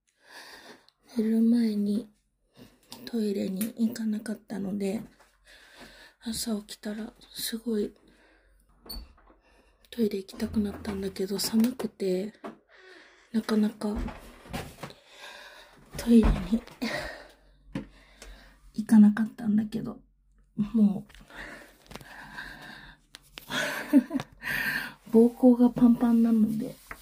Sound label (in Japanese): 急いでオシッコ！ラストにオナラも…; 寒くてトイレ我慢してたけど尿意限界なので